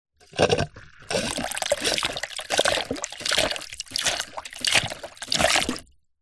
industrial pump siphon hand plastic squeeze squirt